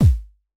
fluffy kick g sharp key

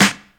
00s short acoustic snare g key

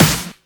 snare sample D# key; snare drum samples

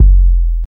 subtle reverb kick drum single shot f key; kick drum samples

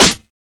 00s wet acoustic snare sound f key; snare-drum-samples

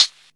good hat sample c key; hat samples

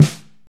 snare sample D key; snare drum samples

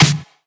Focused Steel Snare Drum D Key

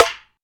dark sounding steel snare drum sound e key

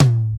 hi reggae tom one shot a sharp key; tom drum

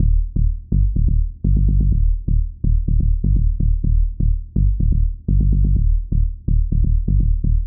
tech house bass rhythm punchy g; instruments; loops

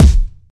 kick drum one shot c sharp key

